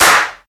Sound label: clap single shot f key; single hits one shots